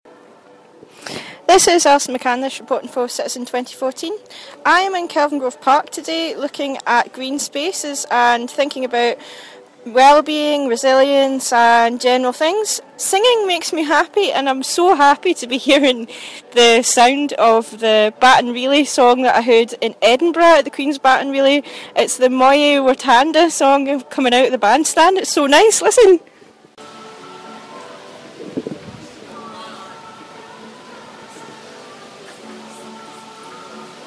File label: singing in the air